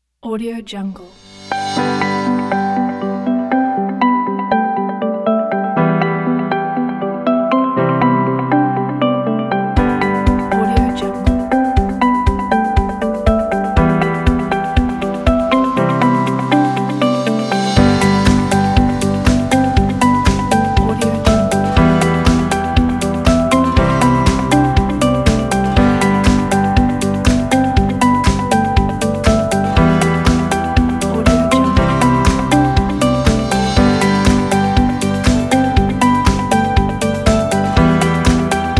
corporate upbeat emotional background

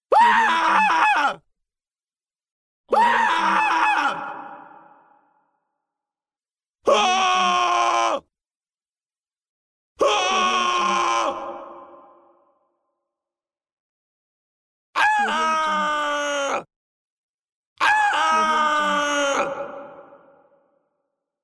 man screaming; voice pack

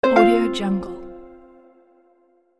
harp

